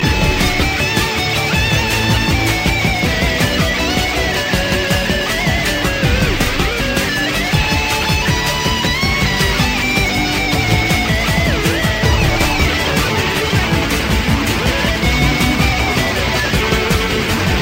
Canon D

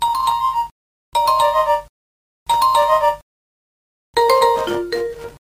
Alarm Clock; Galaxy 2

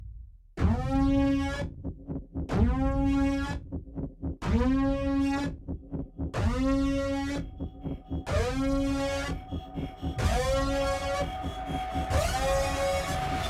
Siren

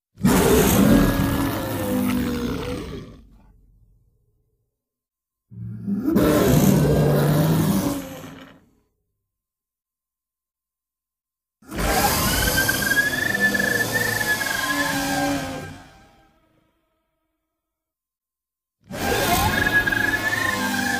Roar; Baragon 2001

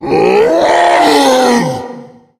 Tank Attack; L4d

